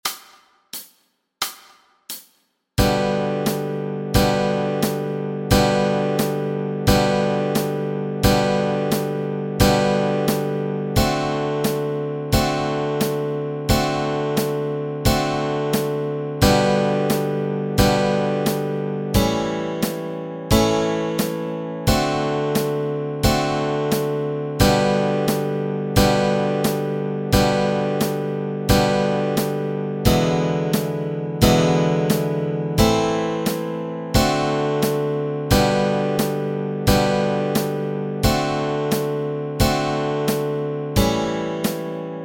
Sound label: You're a Grand Old Flag chords, Easy Guitar